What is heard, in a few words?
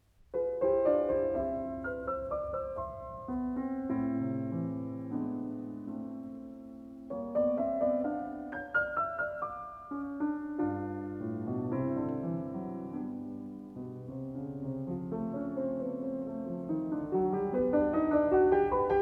Waltz in C major, Moderato; Ludwig van Beethoven - Complete Works [Brilliant Classics 100 CD Box]; Piano Variations IV; 33 Variations on a Waltz by Diabelli in C major Op. 120